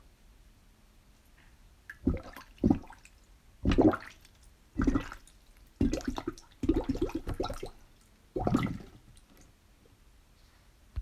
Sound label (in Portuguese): água